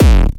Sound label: clean kickdrum sample g key, kick single shot tuned to the G note